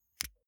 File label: lighter electric switch flame on gas